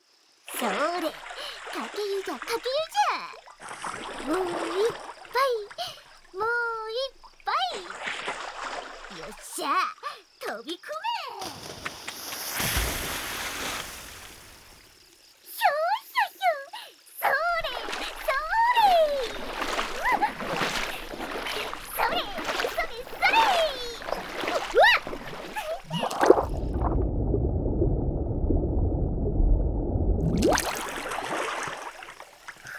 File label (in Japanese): 炭酸シャンプーで頭皮を洗うのじゃ, ASMR